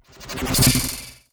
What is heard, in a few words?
Jolt Standard